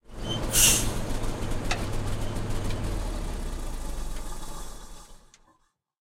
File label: m1 engine stop, tanks; War Thunder